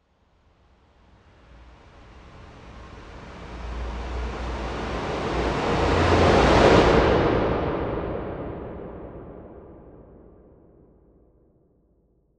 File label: RISER, Cinematic Sound Effects